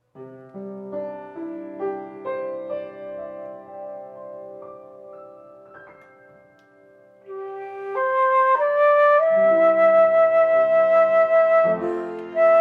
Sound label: piano, flute, violin